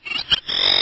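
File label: Giant Red Condor damage; Gamigo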